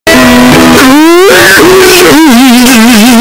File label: laugh